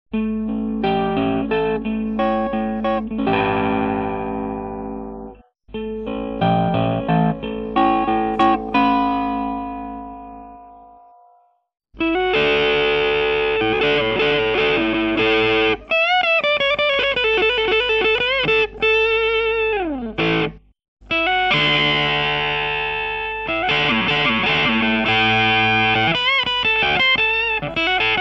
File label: ring fuzz, guitar